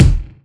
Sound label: clicky steel kick drum sound d key; Duration 0:01